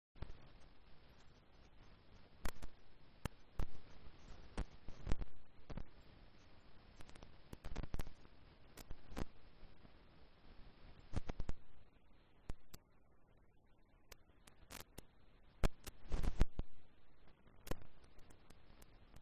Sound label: riverside; Kyoto U; Ashiu